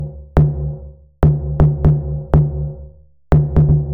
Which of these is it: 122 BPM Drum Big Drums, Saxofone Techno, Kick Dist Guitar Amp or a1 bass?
122 BPM Drum Big Drums